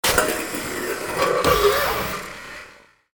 electric door

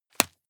juggle catch hard

